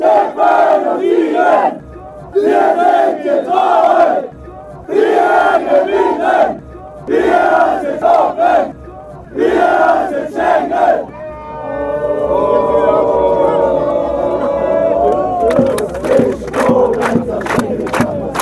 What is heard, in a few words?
fan chants; schengelsche